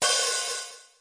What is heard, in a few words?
big open hi-hat; Hats